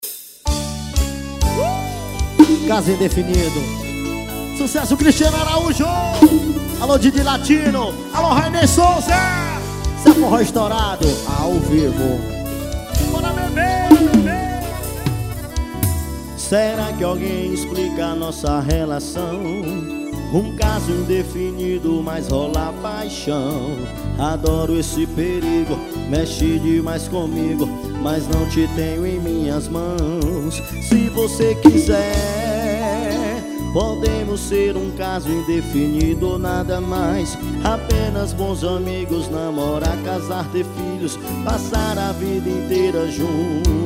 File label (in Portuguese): forró estourado; capela